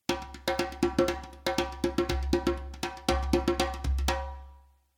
Djembe

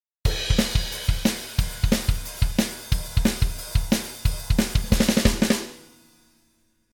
Drum no Glue Comp

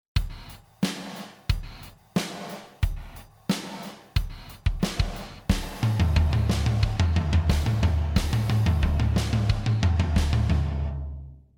gate reverb set

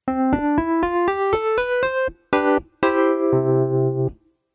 Pianet N

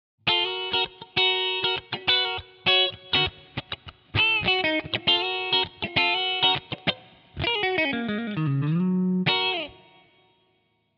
bypass guitar; shaperbox

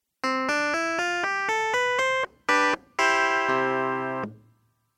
Clavinet C

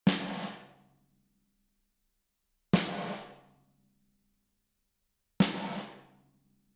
gate reverb snare

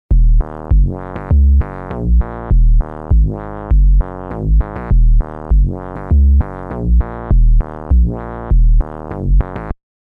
drive bass; shaperbox

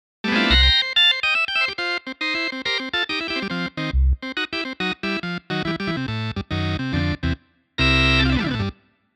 Transistor Compact

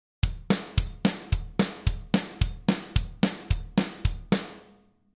2beat

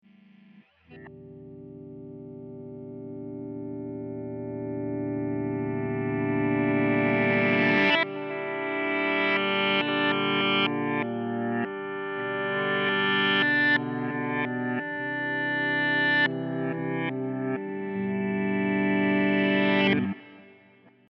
Reverse guitar

